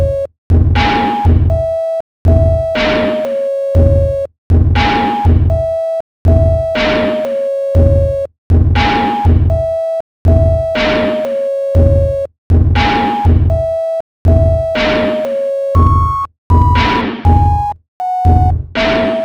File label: Sinewave